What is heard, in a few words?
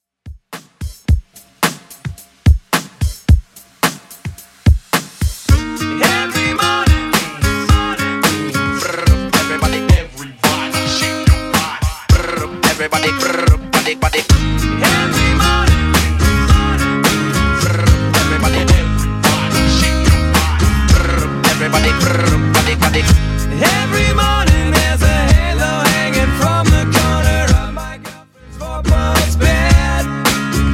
90s Mixshow Redrum 109bpm